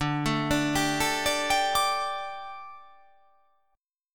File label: D Suspended 4th D, down